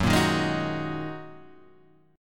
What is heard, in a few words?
Ab 7th Suspended 2nd Gb